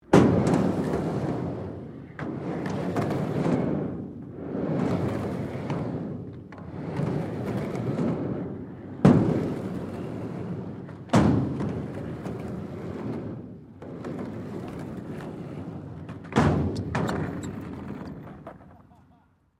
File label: SKATEBOARD HALFPIPE METAL MANEAUVERING; Skateboard sounds; Skateboarding (Sound Effects:Sports)